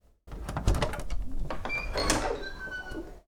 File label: Door Open Slight Squeek